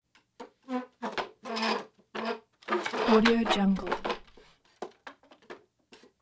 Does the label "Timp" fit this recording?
No